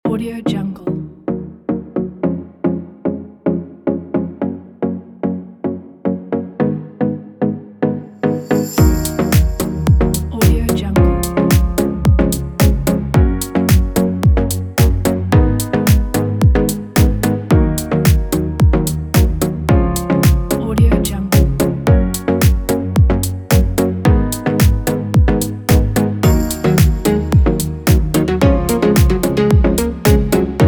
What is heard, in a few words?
summer chill house; rgma